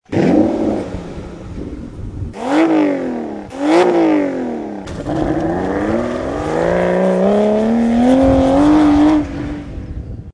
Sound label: vanquish start